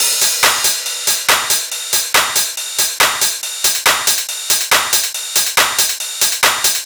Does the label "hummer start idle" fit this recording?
No